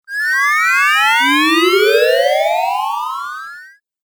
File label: Magic Fx Video Game